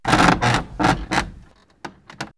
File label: Creaky Wood; X-Static Goldmine